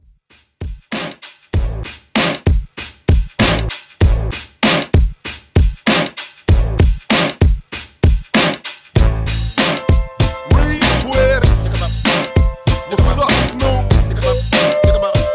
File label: 97Bpm Dirty; Redrum